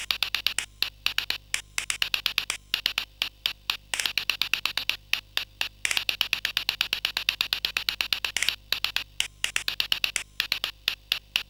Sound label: Tap, sid, CSG8580R5